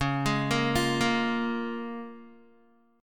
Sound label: Db 6th, Guitar; arpeggiated; tuning=4E,4A,5D,5G,5C,6F